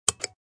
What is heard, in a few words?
fun button; Cars; tractor tip